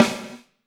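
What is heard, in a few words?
snare drum, Drums; Bob Clearmountain Drums I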